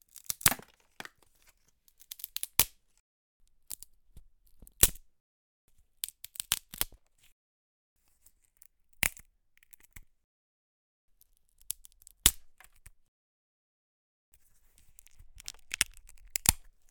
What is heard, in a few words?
Twigs Being Broken